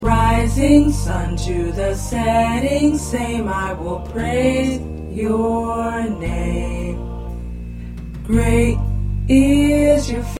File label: Tenor